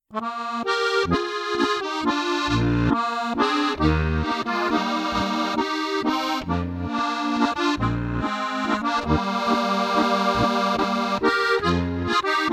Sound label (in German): Harmonika schnell Walzer